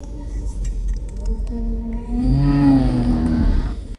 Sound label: deep rumbling monster groan and hum; creatures